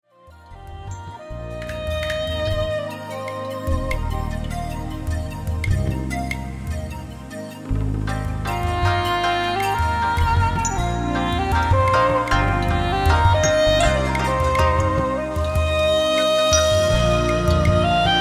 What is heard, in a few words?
Oriental mood